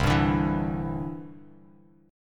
C-Major Add 9th, Bass Standard; strummed

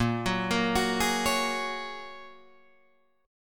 Bb Minor 7th Sharp 5th, Guitar, DADGAD

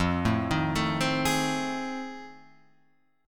F 7th Sharp 9th, Guitar, Open F; F7#9 arpeggiated

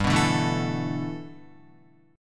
G 6th Add 9th G Guitar, chord; G6add9 strummed

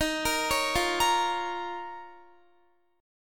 Eb 7th Suspended 2nd Eb, Guitar; x,18,20,18,17,17; 4E,4A,5D,5G,5C,6F; arpeggiated

